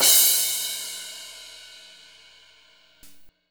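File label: cymbal crash; Best Service Gigapack 2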